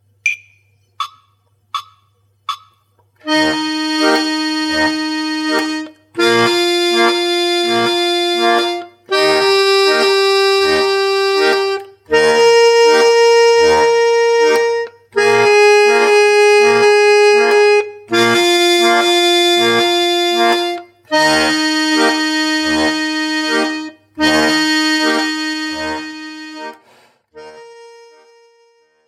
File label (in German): melodiespiel mit bass 4 4 der gerade takt